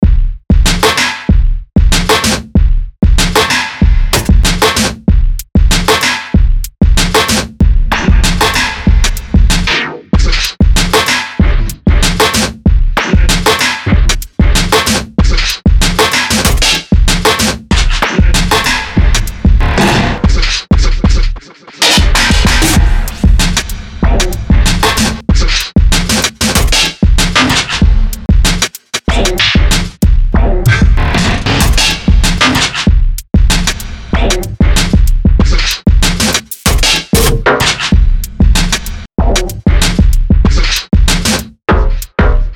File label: smash kit